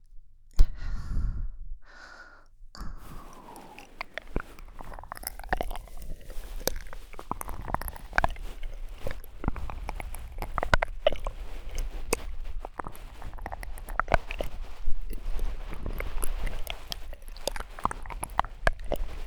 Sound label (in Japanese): ビーズクッション耳舐めコース, ASMR